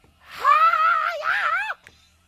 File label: hiiiiyeaah